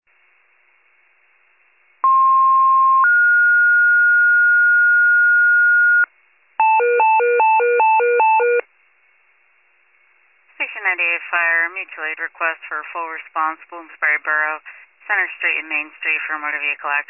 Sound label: FIRE pager alert